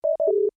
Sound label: computer beep; Trek